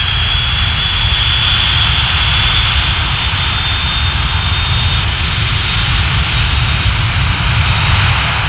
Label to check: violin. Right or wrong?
Wrong